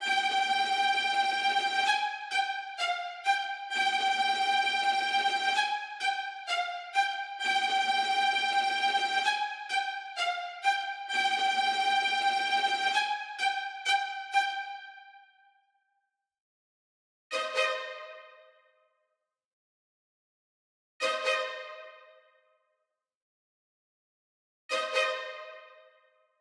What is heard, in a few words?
Orchestra Viola; McRoMusic; Summoning Circle